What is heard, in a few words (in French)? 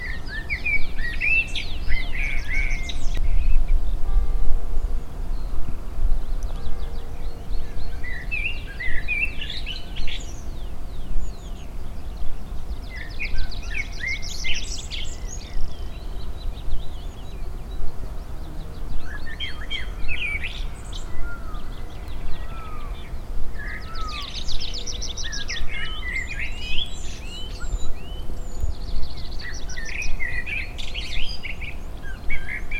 Merle; Cote Lorry